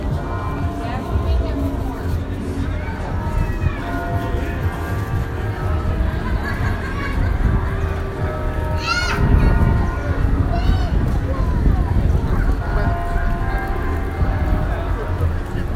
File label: bongs market square